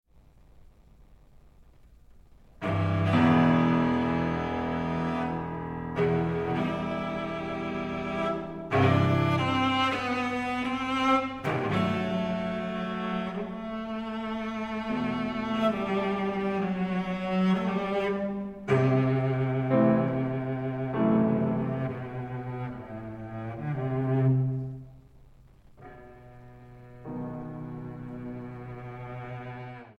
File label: Cello Concerto in E Minor, Adagio Moderato, Lento, Allegro molto, LIVE; Elgar; Op85 I